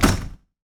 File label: slide door